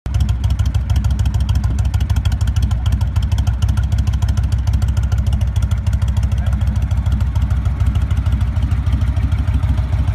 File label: Exhaust Indian Chieftain Vintage Classic Silver Bullet Mufflers; Samson